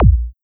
Kick Club

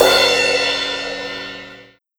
Crash Sabian Swing; TheBlackPearlV1; pitchglitch

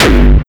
Kick Dist Hardcore

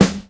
Snare Soft HipHop

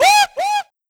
Vocal "Whoooo", exclamation

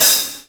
HiHat ClosedHard DeathMetal

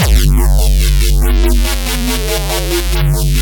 Sound FX Weird Phase, pitch glitch; Granular Sumo

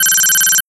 Sound FX Digital Bleep

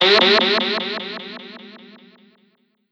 Old Dub

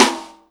Snare Jungle; pitchglitch

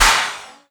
Clap Neon; pitchglitch

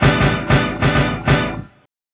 Tom Roll n Break

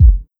Kick

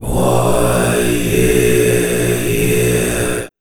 Vocal Puja Chant; Djgriffin

